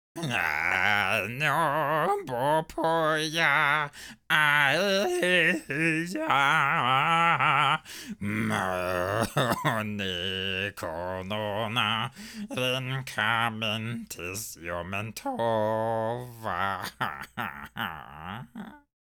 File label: wounded sing